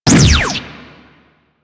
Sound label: Bolto Prime Fire Shot; Warframe; Weapons